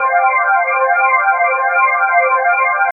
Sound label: C, Pads; Zero-G; Total Drum Bass